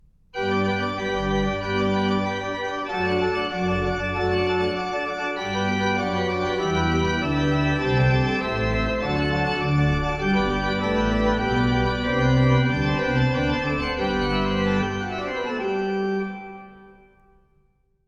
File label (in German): Kleines Pleno, organ